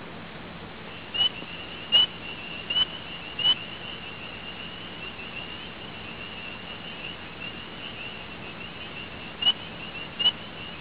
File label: peep call